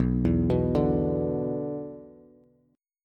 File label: B-Minor Double Flat 5th, Bass; Bmbb5 arpeggiated; JGuitar